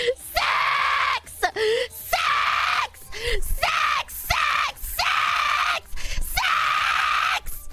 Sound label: screams